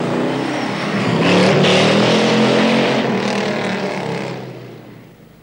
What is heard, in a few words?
Mustang Accelerate, Shift, Decelerate, Accelerate; Mustang Accelerate Around Corner